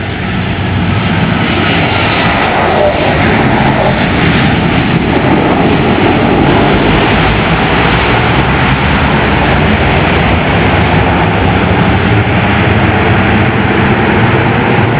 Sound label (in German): Flugzeug